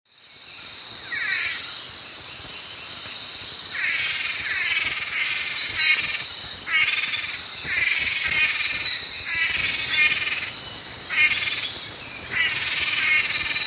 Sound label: Chiroxiphia pareola, lek display songs; S. America; Eastern Lowlands